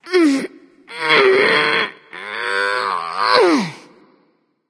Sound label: grunt lift; smite; freya